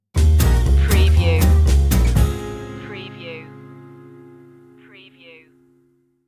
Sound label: manhattan hop stinger